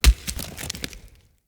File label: Tree Dead Large Destroy Chunk